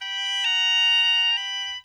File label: Oboe, Orchestral; Sonic Foundry (Sony Creative Software); Orchestral VOL-2